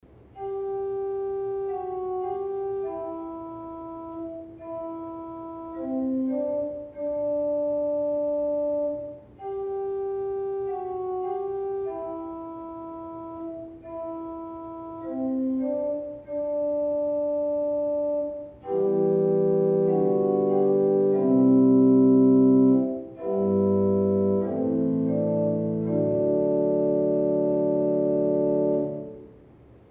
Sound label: Psalm Tone E